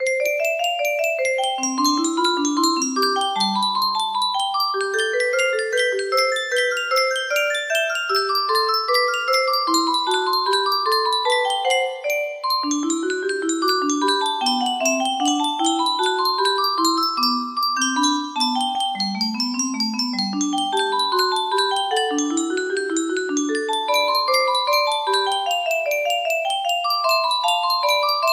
yamaha piano; bach

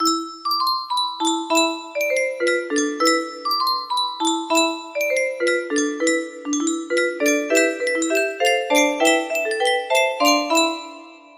music box

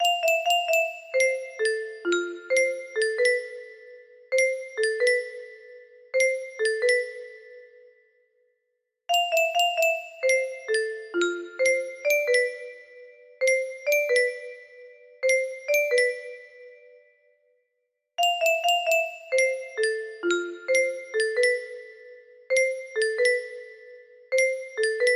piano

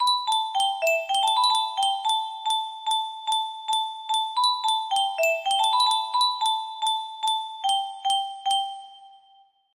melody idea

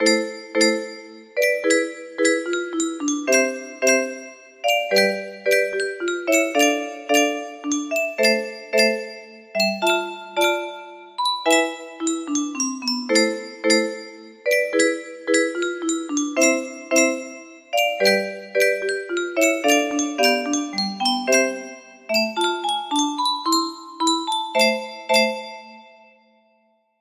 sarabande in d minor, music box; handel

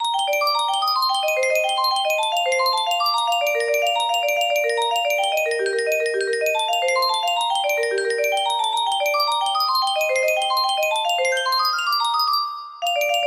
arpeggio melody only

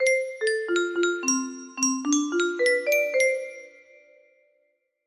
music box

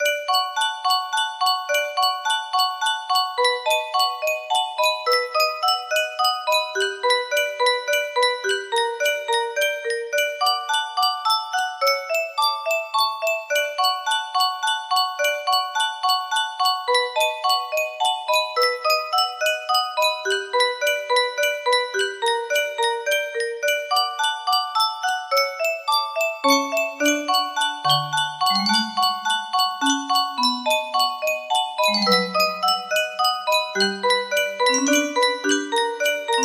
music box cover; undertale

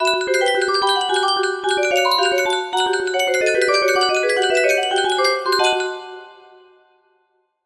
background theme, music box

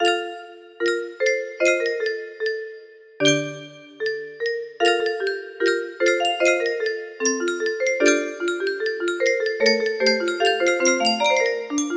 electric piano

